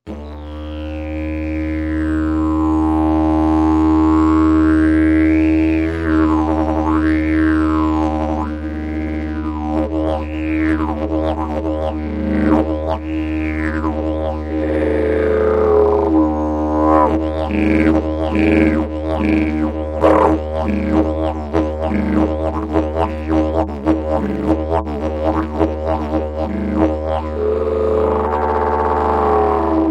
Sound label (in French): Didgeridoo mi bemol